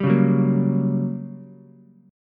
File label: G Minor Major 7th Flat 5th, G, down, Ukulele; strummed; JGuitar